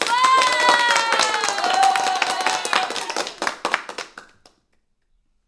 applaud

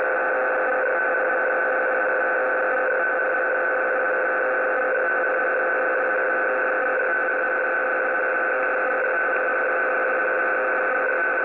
FSK 500Bd 1000Hz

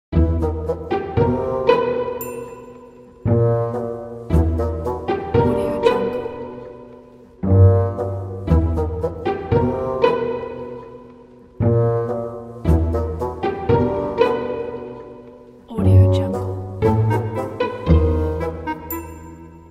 Funny Background Music

